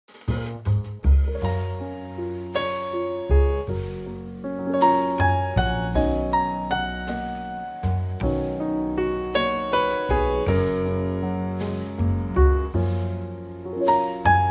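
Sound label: Gb Trio, Backing Tracks for Male Vocals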